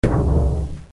BUCKET

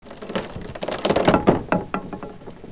wrap it

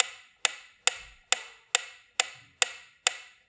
Metronome

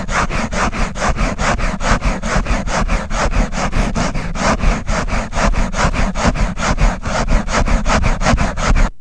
SAWING

